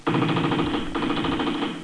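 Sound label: machine gun; mgun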